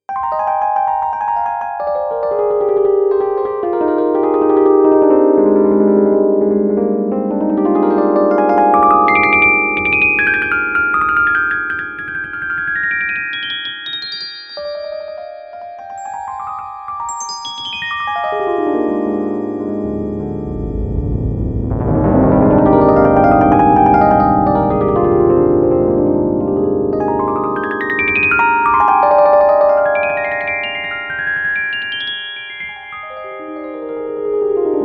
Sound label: minor scale